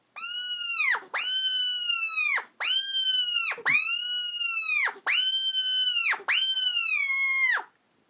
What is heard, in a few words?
squeal; FOX; FOXINATOR; fox call; The OAKWOOD Fox Call